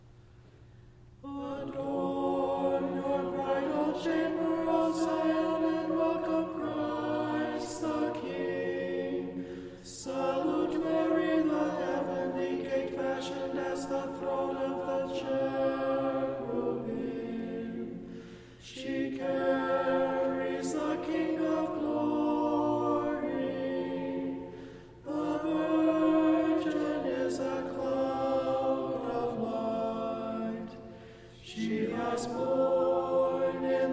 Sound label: Kievan Chant Tenor; chanting tutorial